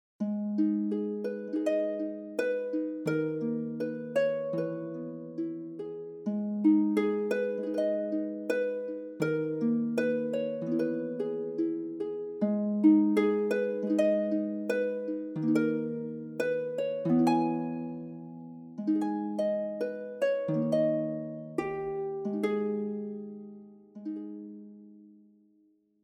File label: lute normal